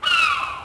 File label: seagul